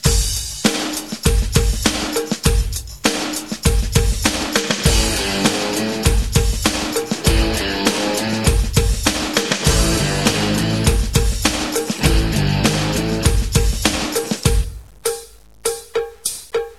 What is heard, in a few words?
music